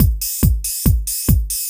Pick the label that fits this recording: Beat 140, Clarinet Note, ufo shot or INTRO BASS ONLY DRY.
Beat 140